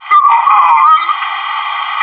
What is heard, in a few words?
pain; maxpack